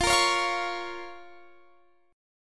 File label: F Suspended 4th Sharp 5th F, Guitar Standard; x,20,20,18,18,21; strummed; capo=3